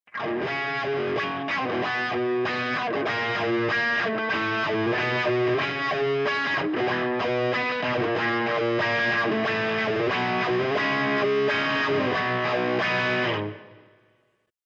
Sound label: wah